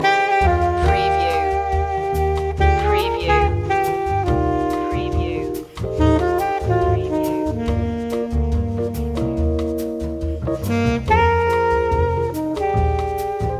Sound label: alto bossa loop